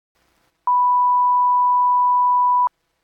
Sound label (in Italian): Sin 1Khz campionata a 12 kHz senza motore a 12 bit; Sonicware; Liven Lofi-12